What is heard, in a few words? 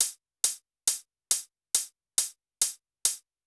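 bass drum hi-hat, Techno 138 BPM; RED ALERT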